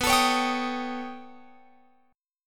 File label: B Augmented 9th B down Guitar Open F; strummed; JGuitar